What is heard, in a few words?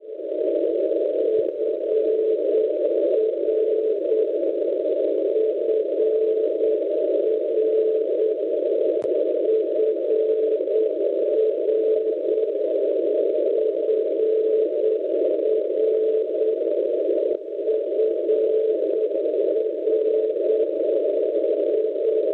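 non-directional beacon 297 kHz; FR; Francoforte; Schwarzsee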